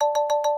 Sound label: count up loop; wild turkey